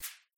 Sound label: board highlight